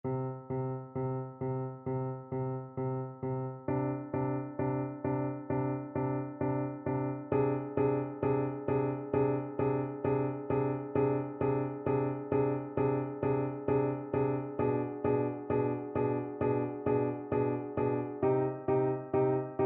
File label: horn, French Horn